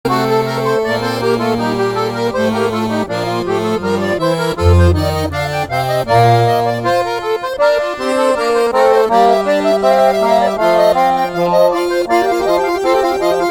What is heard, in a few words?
Fugue in Gm